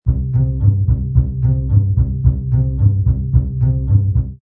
Orch Bass Pz C, Orchestral bass loop pizzicato C Major 110 bpm.